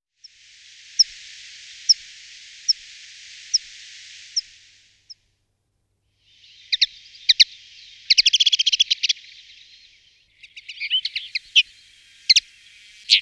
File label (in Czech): Konopka obecna Carduelis cannabina